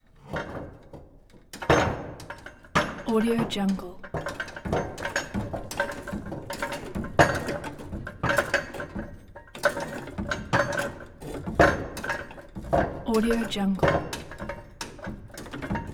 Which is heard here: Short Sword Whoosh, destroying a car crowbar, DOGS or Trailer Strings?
destroying a car crowbar